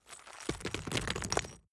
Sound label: stone drop small stones